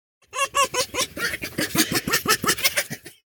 Fox Voice

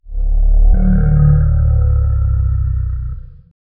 Dinosaur Diplodocus

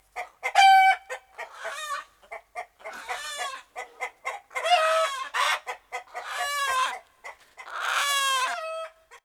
The Hens

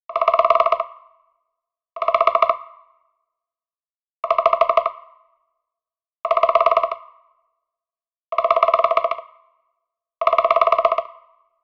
Woodpecker

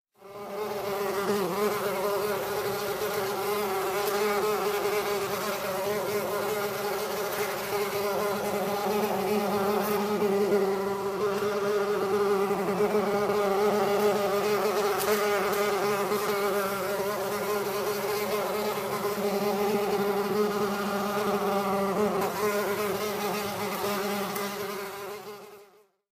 Bees

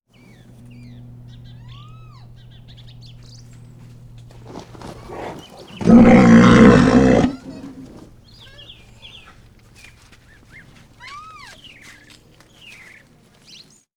Rhino Deep Bellow

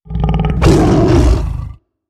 Tiger Roar

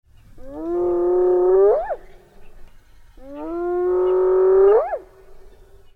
Spotted hyena calling

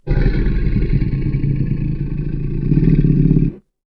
Dinosaur Stegosau

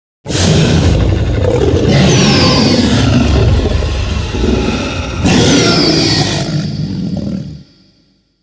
Tyranosaurus Rex

